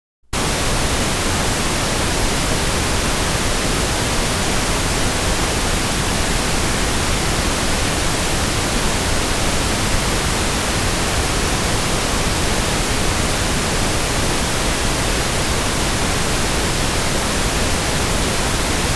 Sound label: pink noise